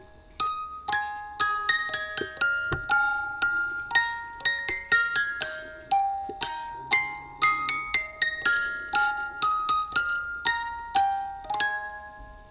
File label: Music Box Loop